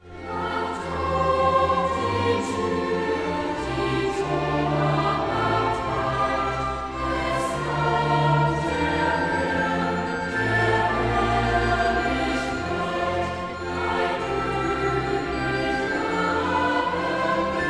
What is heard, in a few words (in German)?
Klassik Chor und Orchester in Kirche